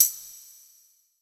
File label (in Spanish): PANDERETA REVERB; MARKYDRUMS